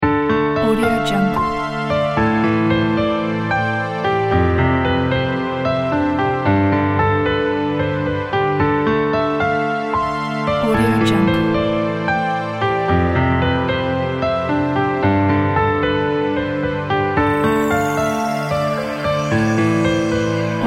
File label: slow motion dubstep piano